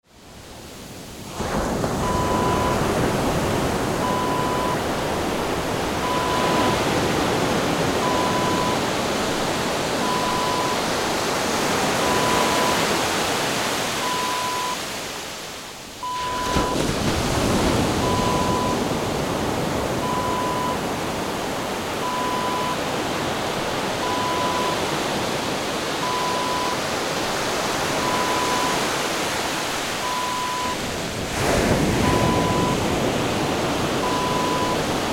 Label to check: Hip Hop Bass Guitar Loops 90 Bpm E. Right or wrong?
Wrong